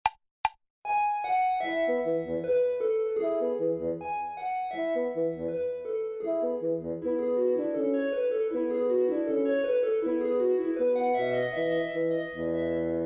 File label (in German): Sonate E-Dur